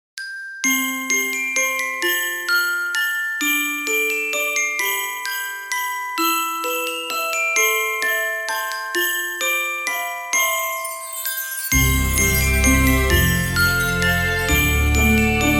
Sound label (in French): musique